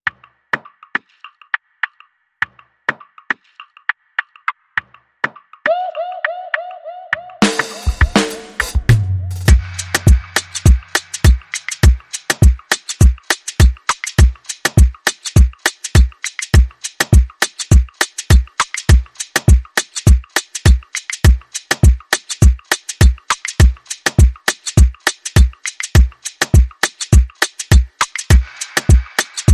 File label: Full Crate Funky Beat